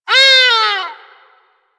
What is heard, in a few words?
Forest Animal Cries